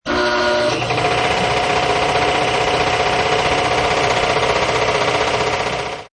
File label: Medium size air compressor being started, strap sliding in the beginning; Air Pumps; Air Pump Sound Effects; LynneMusic